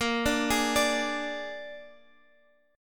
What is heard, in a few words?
Bb 7th Bb down, chord; Bb7 arpeggiated